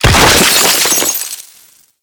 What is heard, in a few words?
Column Destruction; Gameplay; Sentient; Warframe; Tau; Destructible Dynamic